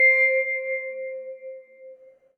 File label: vibraphone C6